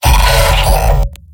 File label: m pain severe; pyro; tf2; garrysmod; mvm